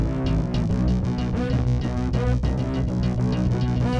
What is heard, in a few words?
bass; musdb18